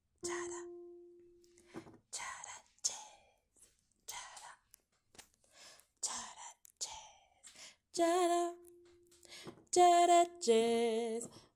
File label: part 1 basses